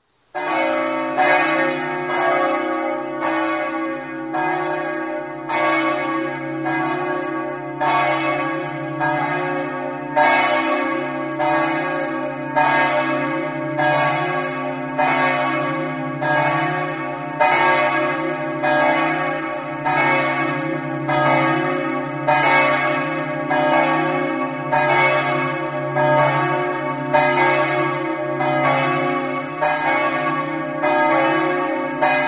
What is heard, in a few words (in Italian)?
fiumicello, campane